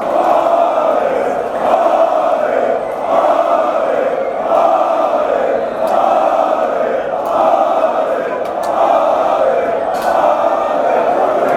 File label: finland chant